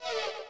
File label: page done